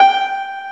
PIANO G4

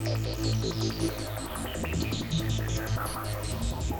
Synth loop (120BPM); musicradar

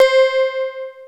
PPG, MICROWAVE; East West - Global Sounds

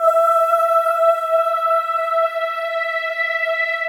Single note (Choirlike), Keyboards

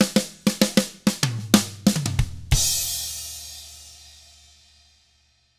Hard Rock Drums; 96HARD ROCK

